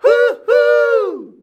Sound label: HUHUUUH D, MALES; Best Service; Hyper Dance